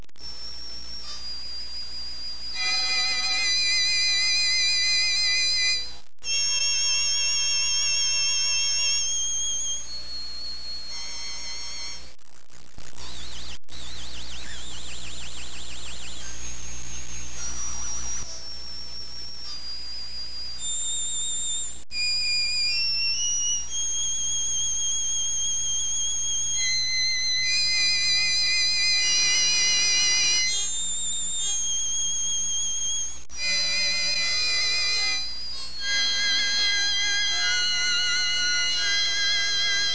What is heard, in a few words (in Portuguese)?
toque